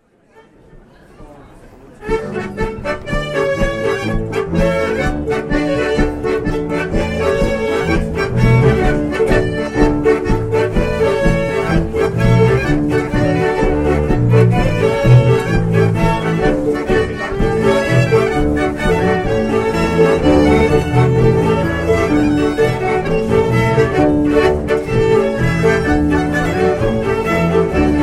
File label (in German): Schottisch; Regen 2010; Bühlerschottisch